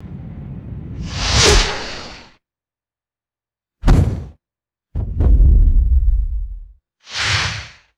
massive cinematic booming impacts with deep rumble